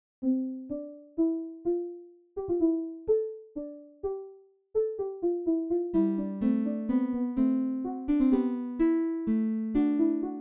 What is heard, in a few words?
plucked strings